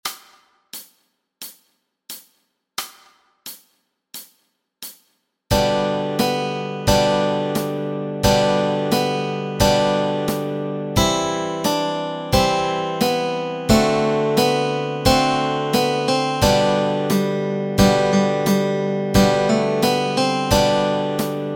This Old Man Chords melody and chords, Guitar and Ukulele